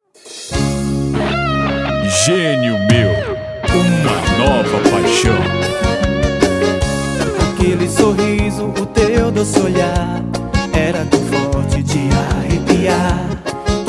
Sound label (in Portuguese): forro